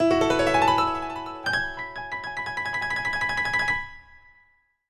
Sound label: Piano Trill, notifications; Optimus G F180K; LG